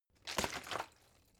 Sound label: bottle water sparse shake single soft; gastronomie; berufe